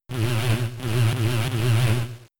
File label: Spite